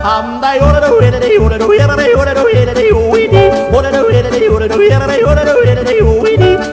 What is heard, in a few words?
yodel